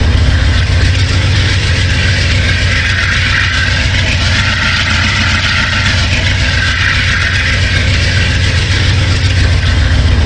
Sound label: Motor, Train